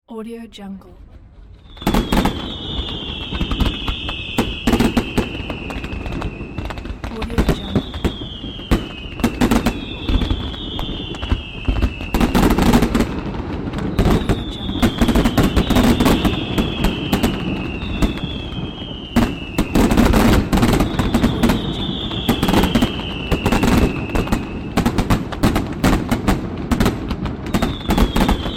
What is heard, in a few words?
fireworks